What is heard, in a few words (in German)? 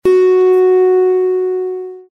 Zithermacher Ton 8 LAUTER